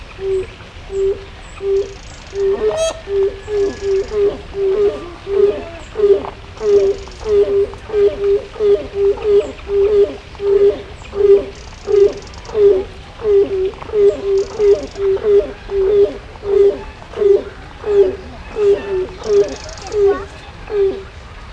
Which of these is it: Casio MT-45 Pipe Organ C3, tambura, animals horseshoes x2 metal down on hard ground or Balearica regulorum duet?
Balearica regulorum duet